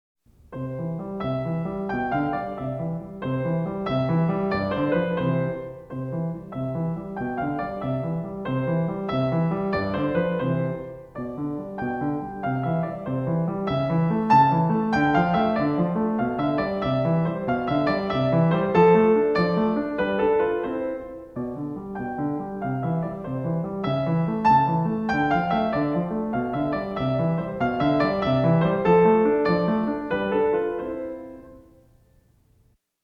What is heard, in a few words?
Waltz; Magrath Book 03